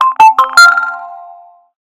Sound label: oppo k9x sms ringtone